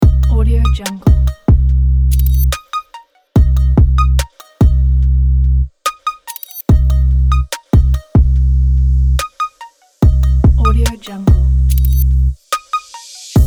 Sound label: digital environment